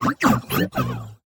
shy, flattered beeps; 守望先锋2; 堡垒; 局内触发

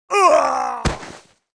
Acolyte death

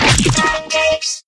pancake explo

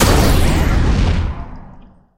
explo; robotwin

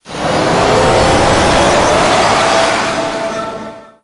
rage spell

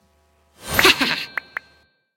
Emote Heal; Spirit; Thumb Up

